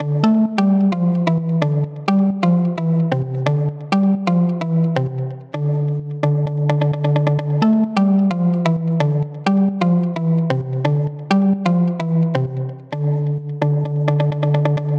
SYNTH PLUCK 7A 130 MELODIA, tribal house

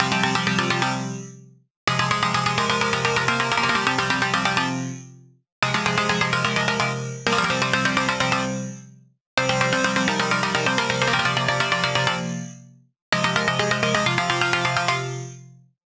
Pluck Loop 5a 128, tribal house

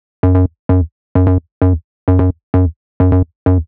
Bassline Proguessive f 130

